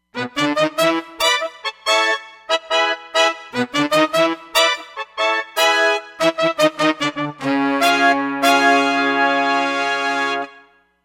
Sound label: X Brs Sect 2, Roland Fantom XR